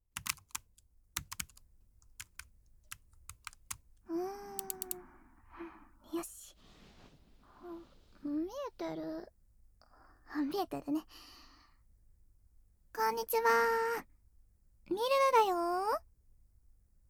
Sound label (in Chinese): 舔耳自慰直播♪